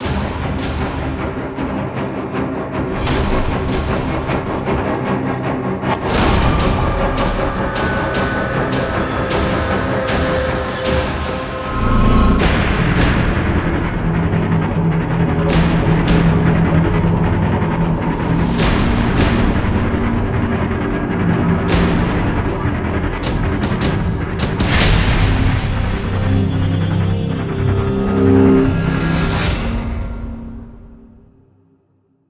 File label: Base Loop low